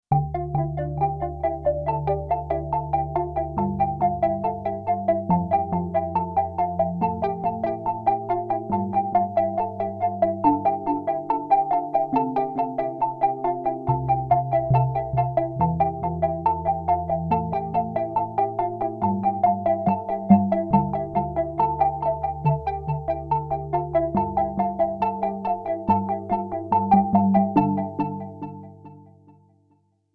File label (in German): T-6 Triangle mit Phaser und Delay